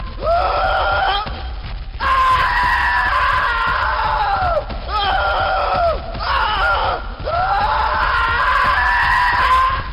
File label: scream